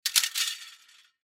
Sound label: Curtain Rod Extended Drop Slide Aluminum; Window Sound Effects; Foley